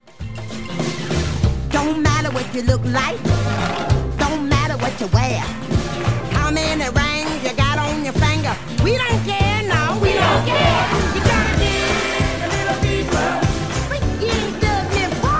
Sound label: jazz; jazz mini duo